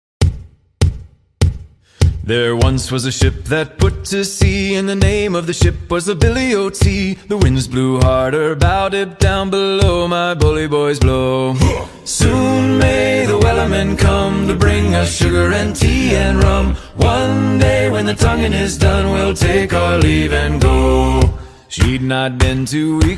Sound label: Sea Shanty Medley